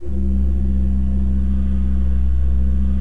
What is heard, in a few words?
C1, Cathedral Organ; ILIO; Propeller Islands